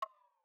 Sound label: checkpoint slower